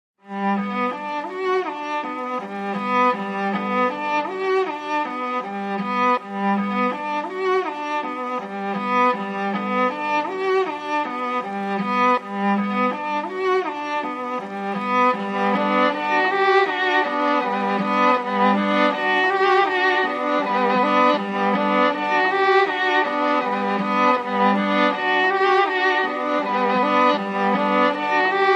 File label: Strings Only